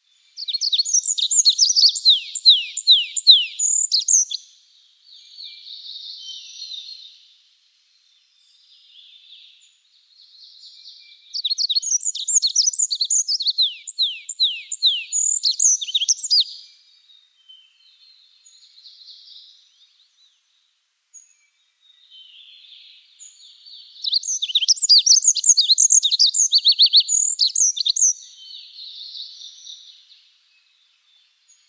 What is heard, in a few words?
Olive-backed Pipit song